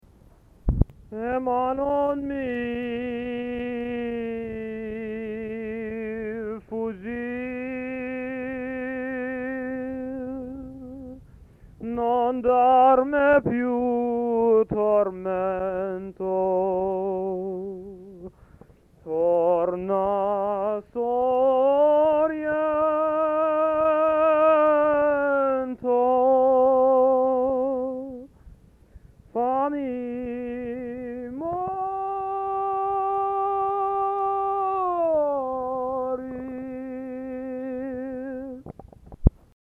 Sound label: Dad singing